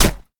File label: shot silencer